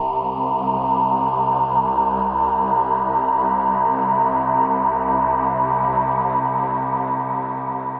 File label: Glide Through Space, Pad; Drone